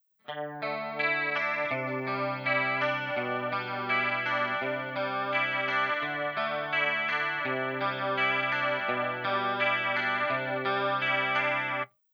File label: chorus